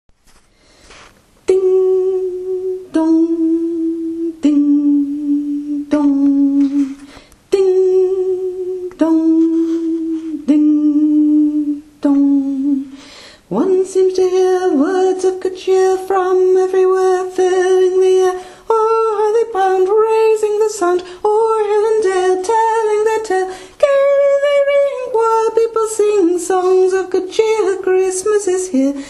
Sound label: alto; carol of the bells